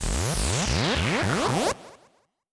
charge echo

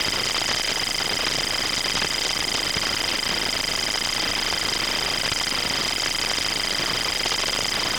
synth noise low loop

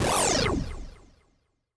weaken

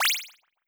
button

